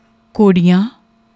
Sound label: ghorian, singing style; folk singing style; typically sung during the time of a Punjabi marriage ceremony